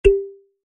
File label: Click; conservation catapult; project green